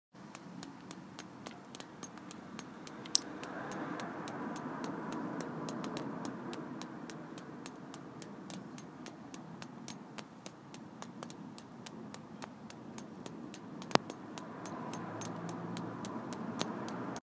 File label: Raindrops